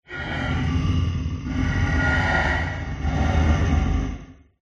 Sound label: horror demonic growl huge growl wide